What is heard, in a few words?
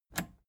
plastic button scratch smooth release disable